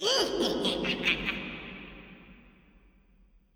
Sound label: Laugh; Leure